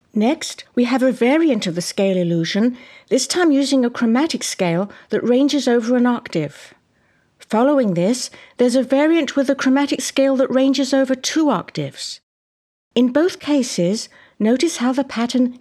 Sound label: Chromatic Illusion, musical illusions; Deutsch; auditory demonstrations